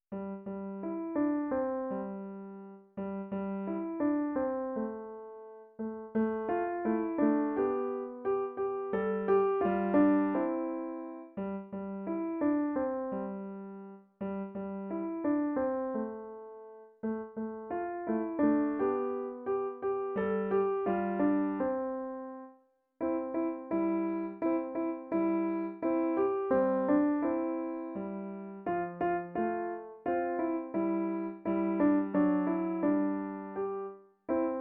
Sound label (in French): Vive le vent, piano